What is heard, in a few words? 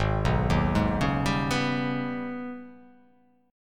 Ab Minor Major 11th Ab; arpeggiated; JGuitar